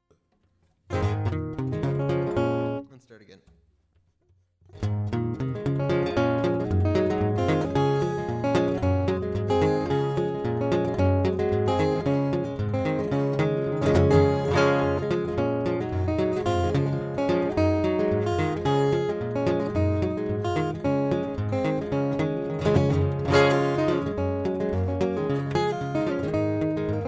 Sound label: guitar